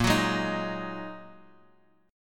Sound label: Bb 7th Suspended 4th Sharp 5th, chord; 3,6,4,6; 4G,5C,5E,5A; strummed